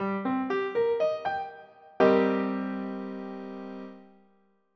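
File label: C M7, guitar; GUIROOM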